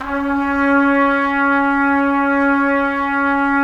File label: Trumpets; Roland - LA Composer vol. 4